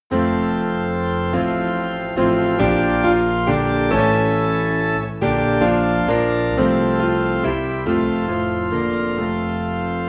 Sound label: Piano and Organ Low in F